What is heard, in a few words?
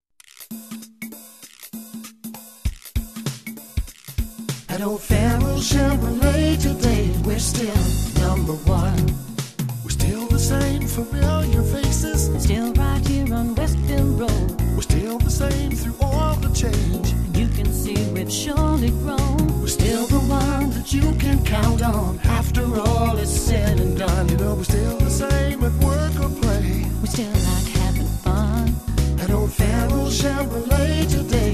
Jingle